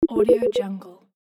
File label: notification